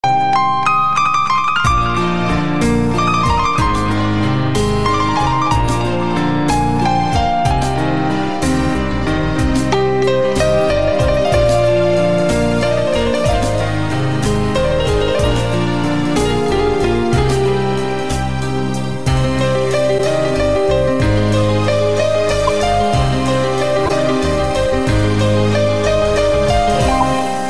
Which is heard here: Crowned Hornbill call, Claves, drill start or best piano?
best piano